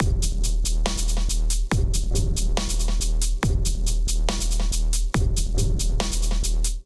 Kit Melodic 140 bpm, Drums, Complex; MIDI Clips; Sol Hard Kit; Ableton; Factory Packs; Skitter and Step

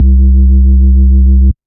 Sub Tonic, bass; Ableton; Glitch and Wash

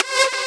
Synth; Launchpad Mini MK2; Lightshow Pack Project

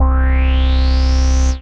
Reverse Wink, Bass; Basses; Ableton; Creative Extensions

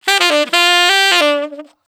NuJazz Sax, Jazz, Brass

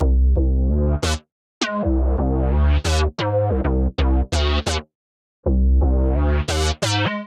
C Minor 132 bpm, bassline; MIDI Clips; Tonal; BazzHouz; Skitter and Step; Ableton Factory Packs

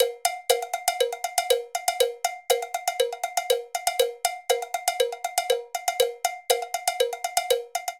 Mozambique Cowbell 120bpm, Latin Percussion; Ableton; Factory Packs

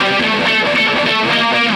137 Garage Guitar Am; Dance DJ

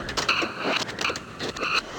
Endless Blow, Effects; Ableton; Granulator III

